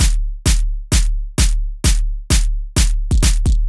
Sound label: kick and clap, edm drum